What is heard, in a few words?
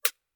shot